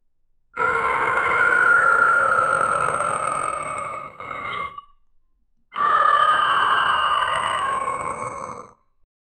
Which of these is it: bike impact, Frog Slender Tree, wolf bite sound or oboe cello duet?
wolf bite sound